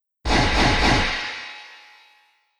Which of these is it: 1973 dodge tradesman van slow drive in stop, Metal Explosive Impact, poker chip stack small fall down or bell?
Metal Explosive Impact